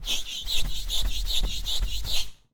blackboard turn off

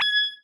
decision, button

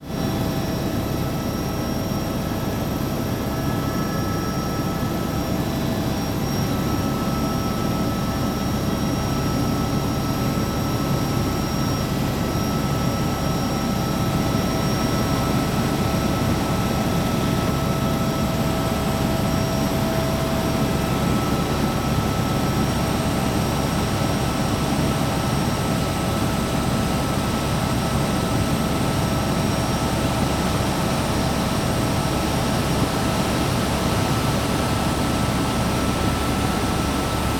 helicopter flight

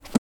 book close

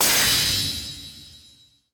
sword clash

